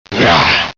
stunned; xinalized

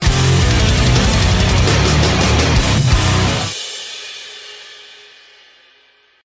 outtro music

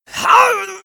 backstab; freak fortress 2; some clean trash

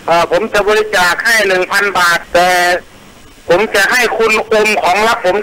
win; thaimemes; agent pink

